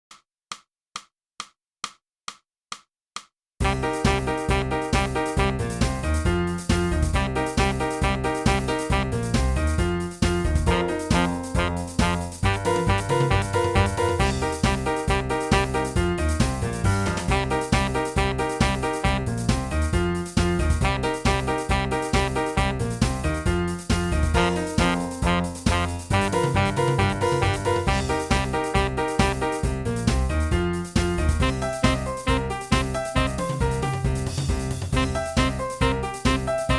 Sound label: sax